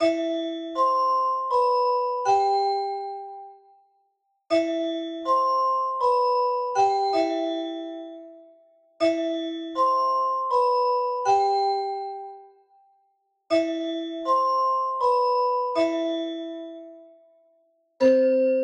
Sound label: Music Box; Unrest Indigo